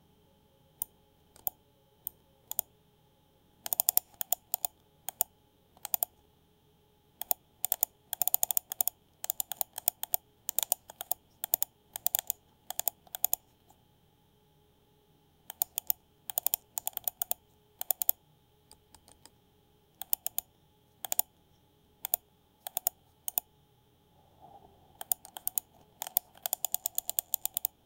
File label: calming yet subtle sound